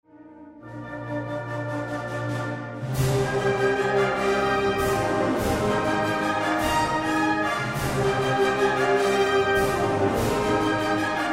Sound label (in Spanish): bajo